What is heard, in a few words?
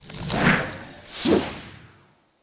Yeti Ice Spell